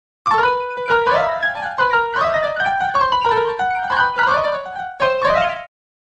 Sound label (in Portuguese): piano musica legal